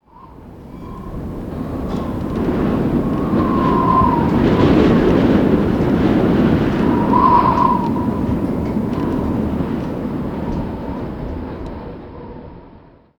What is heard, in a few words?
wind gust, ambient